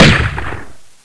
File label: Magnums; Lara; Playable Tutorial Level